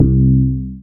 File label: BAS FM DX-7